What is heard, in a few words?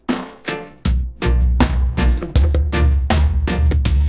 music gen